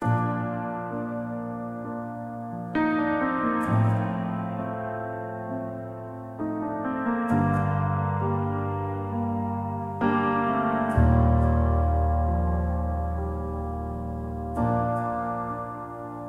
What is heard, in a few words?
Piano Prelude - Bright Room; Stereo chorus effect plugin